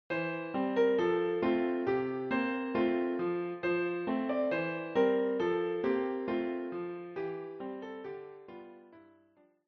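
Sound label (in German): Brüderchen komm tanz mit mir Klavier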